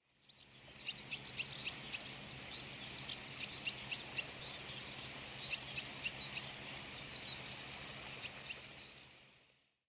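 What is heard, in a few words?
zitting cisticola, hyogo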